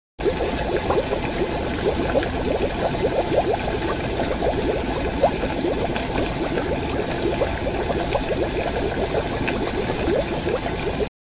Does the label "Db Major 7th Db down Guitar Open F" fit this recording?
No